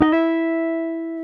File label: Jazz Guitar